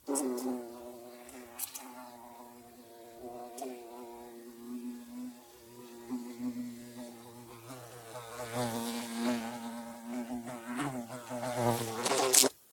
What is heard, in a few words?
Insect, Fly - taking off, buzzing around, landing close; Audionym; The Fly